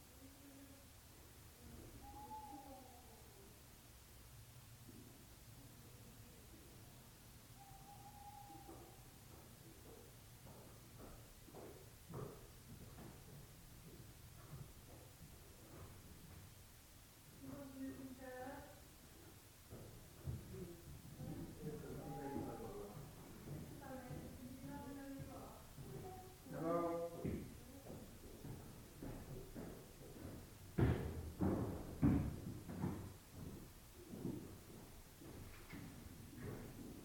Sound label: demonic groan